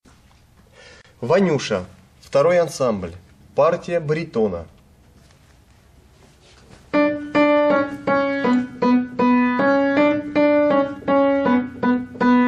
Vaniusha bariton, ruschoir

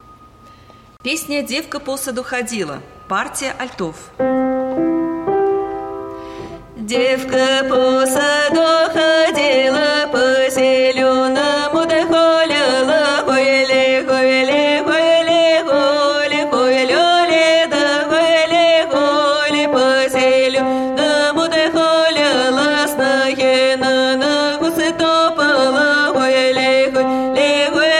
Devka po sadu hodila alto, ruschoir

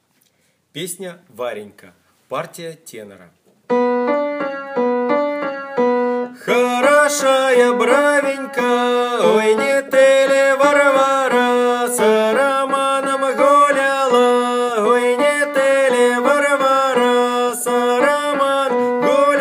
Tenor; Varenka

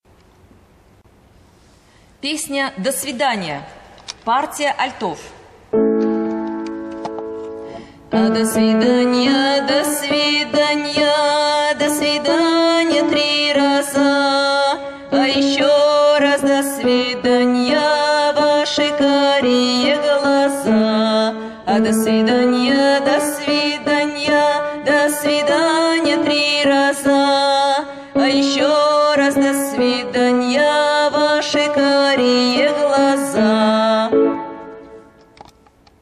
alto; Do svidania

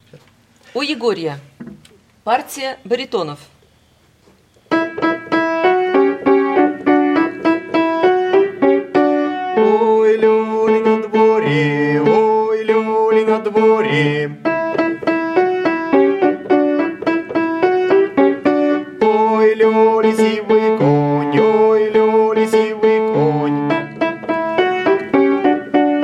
baritone; U egoriya

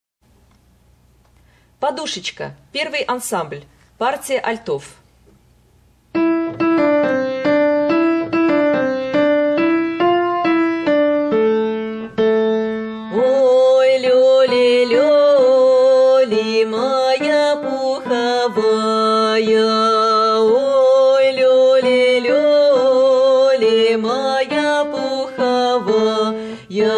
Podushechka alt, ruschoir

